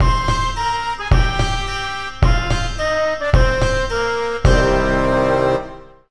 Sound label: Defeat; Krinkle Krusher; PS Vita; PS3